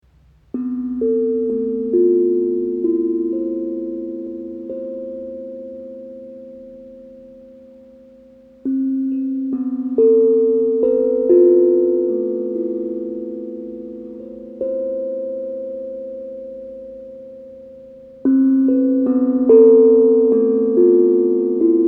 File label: bleu tongue drum; akebono